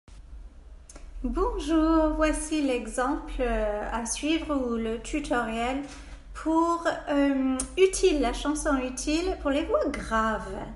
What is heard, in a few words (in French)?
Utile - voix graves